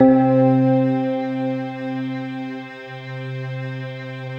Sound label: Fender Violin, Fender Stack; Optical Media International; Sonic Images Library